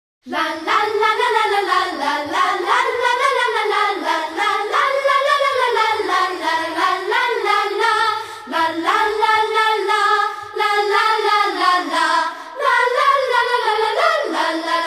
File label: Christmas Jingles; Koka Media Productions; More Christmas Jingles